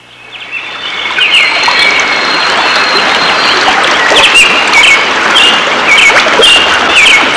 BIRDS RVR, NATUR

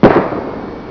GUNSHOT; HAUSHALT

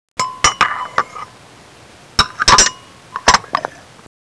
GLASS ON; HAUS

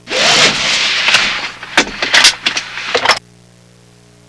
BUCKLING; HAUS

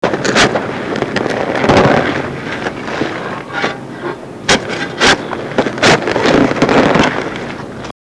DIGGING; HAUS

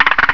RATCHET; HAUSHALT

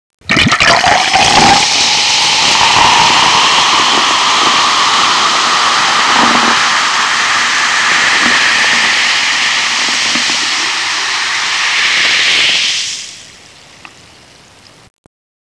POURING; HAUS

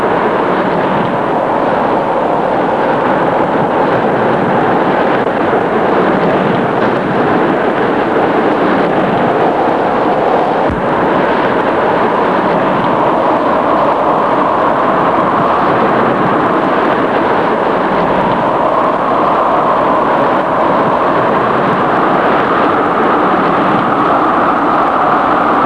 WIND RN2, NATUR

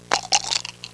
DICE CUP; HAUS

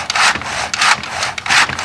HANDSAW; HAUSHALT

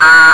DIG HONK; HAUSHALT

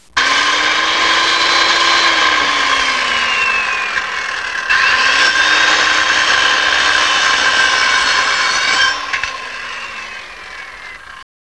drilling; HAUSHALT

